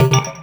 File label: warning